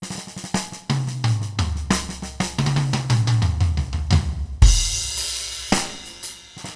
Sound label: Drum Solo; speed 0.83; tsmtoolbox